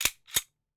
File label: gun cock slide reload; nova